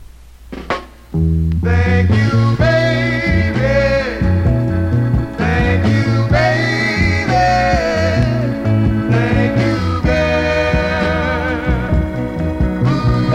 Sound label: singing